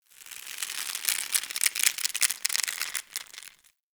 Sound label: Tendon Stretch Medium Close; Ambience; Warframe; Infested Eris